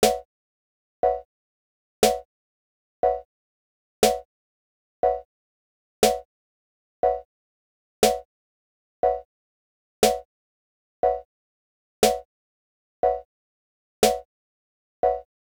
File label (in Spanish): Blanca 60bpm